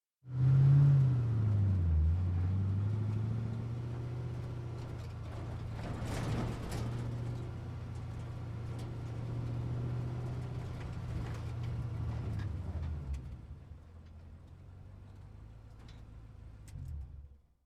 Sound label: muscle car start and drive away